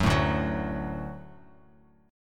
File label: D Major D down Bass Standard; D strummed; JGuitar